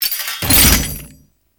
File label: Fall Knees Default, Foley; Warframe; Duviri; Dax